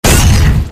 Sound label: blaster fire